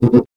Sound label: gorilla cry